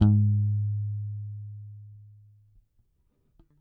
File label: Whole Note One Shot G#, Bass; Bass X